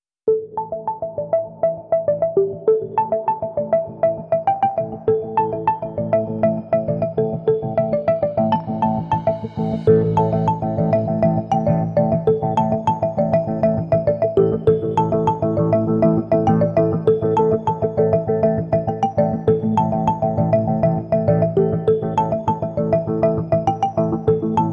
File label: Bed